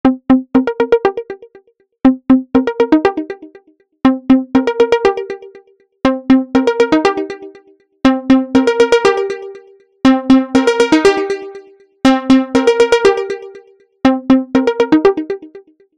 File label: arp sweep